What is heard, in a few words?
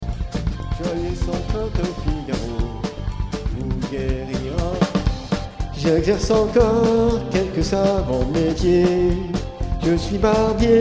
soprano